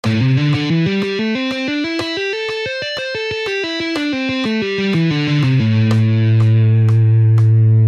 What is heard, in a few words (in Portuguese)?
Exercício tapping